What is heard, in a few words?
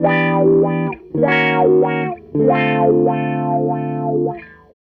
SG Guitar Loop